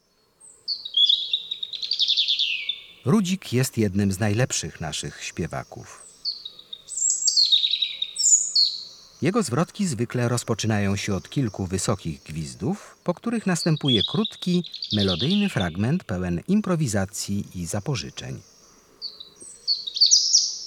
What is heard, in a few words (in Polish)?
Rudzik, Ptaki; Kurs PTTK